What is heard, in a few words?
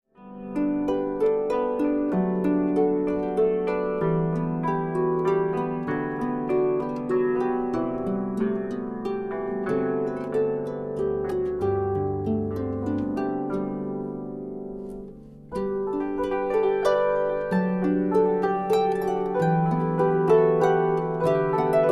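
Nightingale, auf Harfe & Hackbrett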